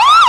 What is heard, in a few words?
yelp, siren; gtav; gmod; svmod